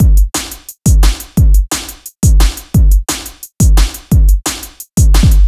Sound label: DnB House; flstudio